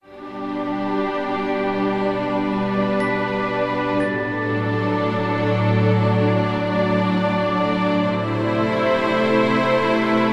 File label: Heavenly Ambient